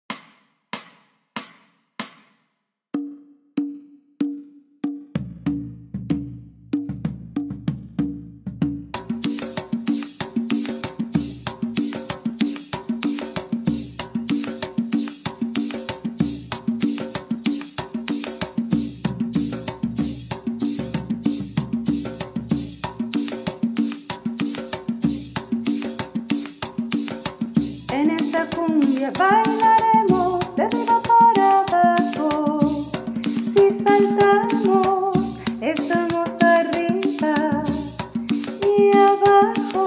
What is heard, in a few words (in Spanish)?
CUMBIA